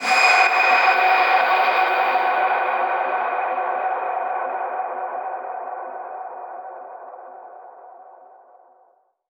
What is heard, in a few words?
FX Misc